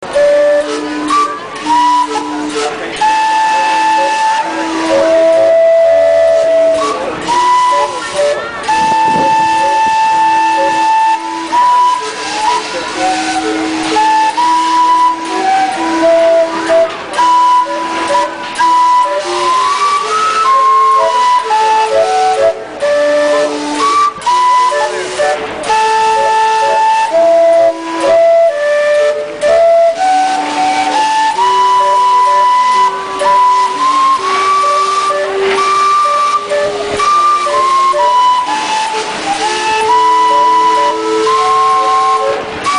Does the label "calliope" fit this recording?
Yes